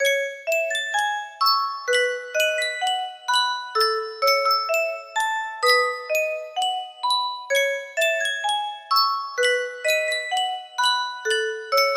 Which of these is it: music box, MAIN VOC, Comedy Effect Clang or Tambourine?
music box